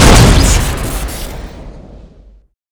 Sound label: rocket shoot crit; tf2classic